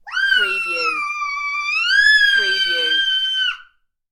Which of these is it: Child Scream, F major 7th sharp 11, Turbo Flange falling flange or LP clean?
Child Scream